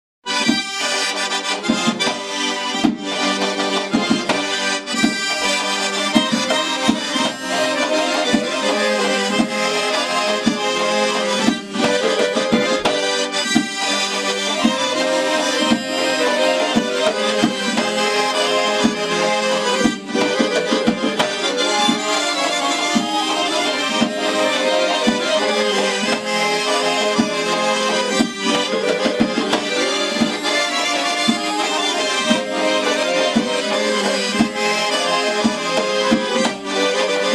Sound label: georgian folk dances jeirani